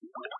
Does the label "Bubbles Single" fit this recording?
Yes